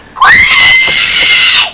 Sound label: scream